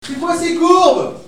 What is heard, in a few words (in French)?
cri